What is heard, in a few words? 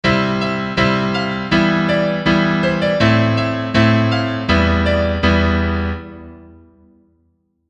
diatonic